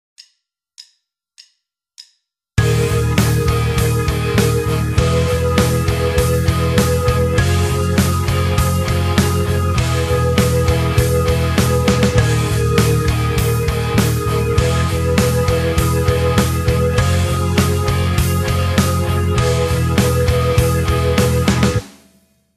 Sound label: Rockschool backing; Guitar Techniques 2018 Issue 278 February